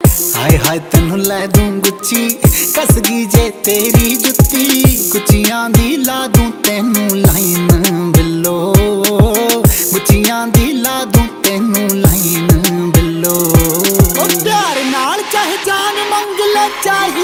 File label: Punjabi Ringtone